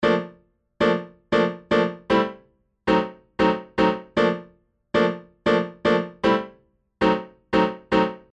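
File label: acoustic piano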